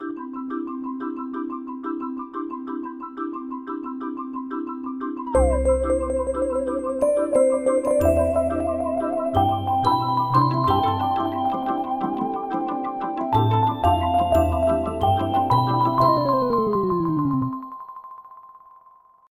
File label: marbles